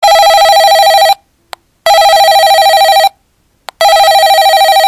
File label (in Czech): zvonek interkom